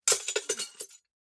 Glass Break; SoundsCrate

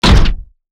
Robotic Footstep; SoundsCrate

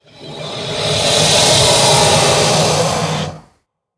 crocodile hiss

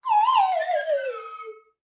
Slide Whistle Wobble Down; SoundsCrate

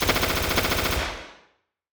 Assault Rifle; SoundsCrate

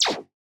scifi weapon synth blaster; soundscrate

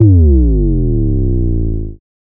Bass Drop; SoundsCrate

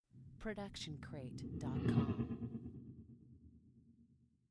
scifi fly by; soundscrate

